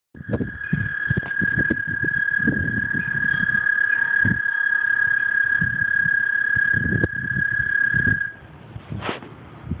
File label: american toad